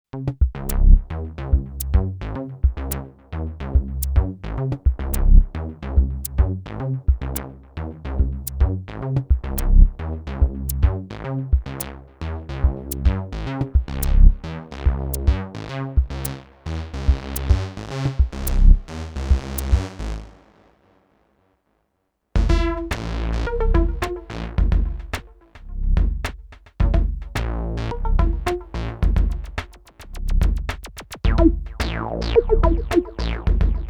Dance Electro

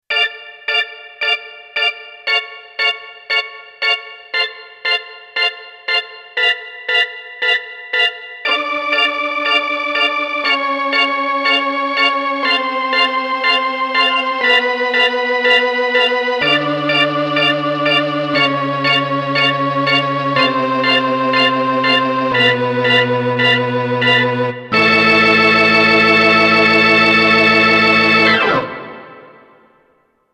Organ; Mk I; CRIMSON

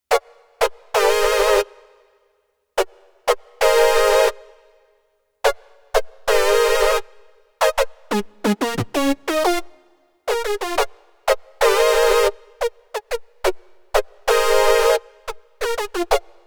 Hero Brass; TEO-5

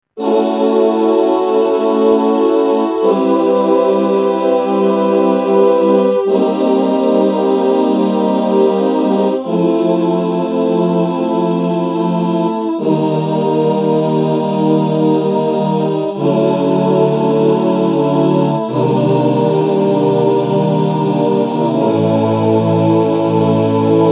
male oh choir custom

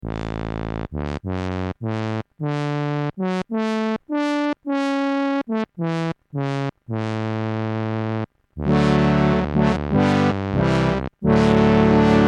arp pro soloist tuba with vibrato

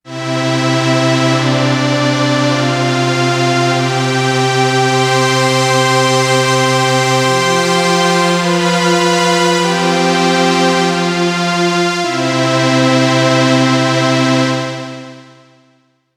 Synth Strings; sequential

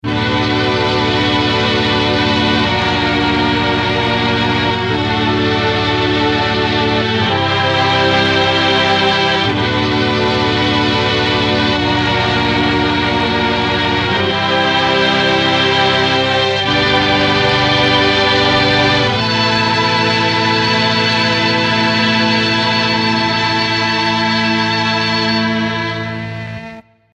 strings

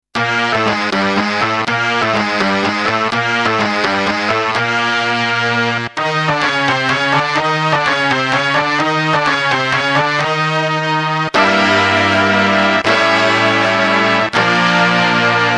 mk2 brass

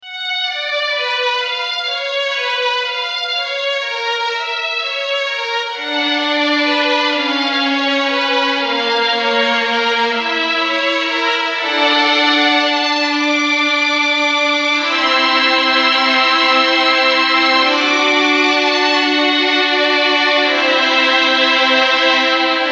Violins

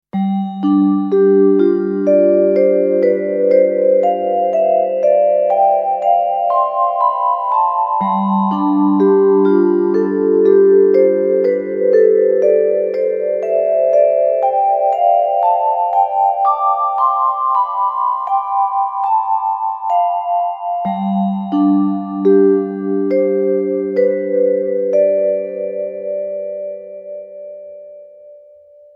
vibraphone

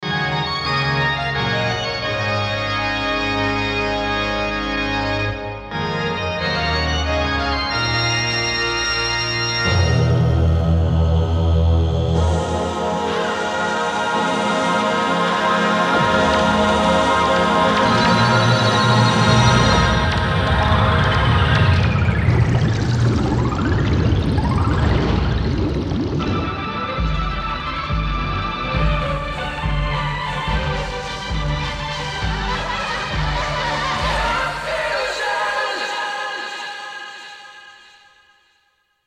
Stage Sounds

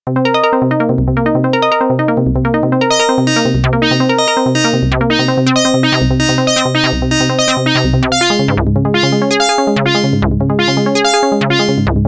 Mod My Arp; sequential

